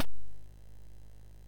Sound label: sid MOS6581R2; California Games